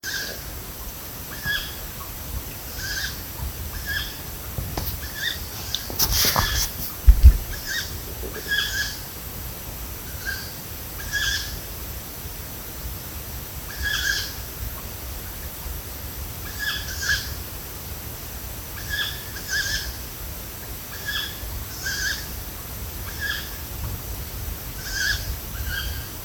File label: Bird Call